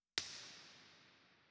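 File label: IR sweep binaural; IMP-RESP; Bergamo; Dodecaedron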